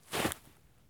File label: jump grass low; Escape from Tarkov